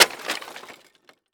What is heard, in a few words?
Crash Concrete Smashing On Wood And Glass Debris Short; Crashes